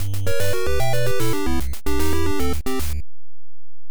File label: Round Start; Vs. Hogan's Alley